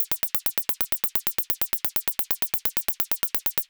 Anabeat Hat; Beats; Sonic Foundry (Sony Creative Software); Prototechno

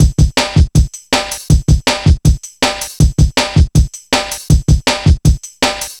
160, Drum Loops; Total Drum&Bass

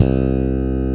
tite bass C3; Drums In Your FACE!

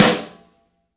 SN, LA SNARE

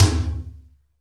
ROOM TOM; EdgeSounds; Drum Mashines; R-8 DRUMS

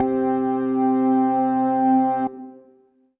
Synth C, Synth Prophet One Shots; Electronisounds

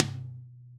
tom, Roland MC-909

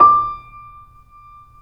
C7; East West; The Ultimate Piano Collection

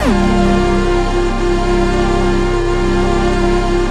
JV-1080

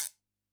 GUIRO, Yamaha DD-65; PERC

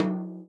TOM, Real Drum Kit; Best Service ProSamples vol.43; JUNGLE KIT

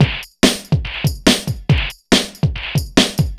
Jungle, Drums Beats

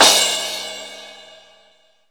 DRM, YAMAHA R5+RX; Best Service ProSamples vol.55 - Retro Sampler; AKAI

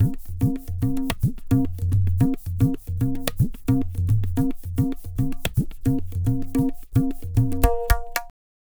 AMROHA, tabla, 110BPM; Zero-G; Pure Tabla

